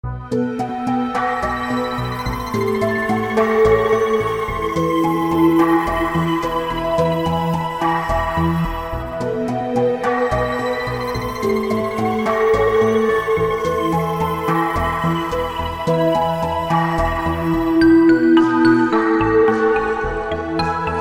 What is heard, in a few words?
Shipwreck Shore Underwater; DKCTF